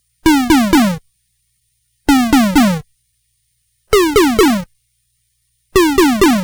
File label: Game Boy FX Laser Toms Loop, chiptune; Nintendo Game Boy